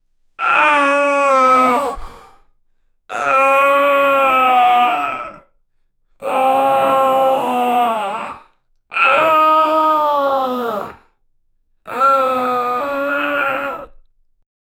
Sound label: male groan of pain; emotion